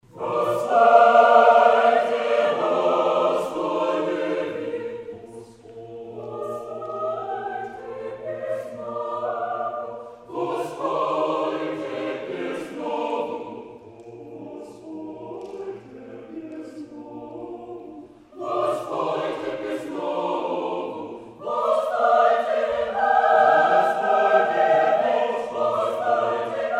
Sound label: chamber choral concerto; bortniansky